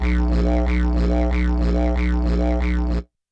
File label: Digeridoo